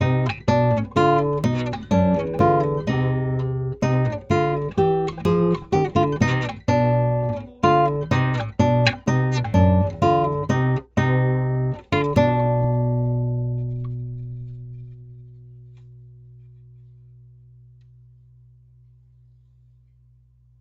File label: guitar; unununium; crystalis